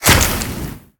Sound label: large impact; smite; thanatos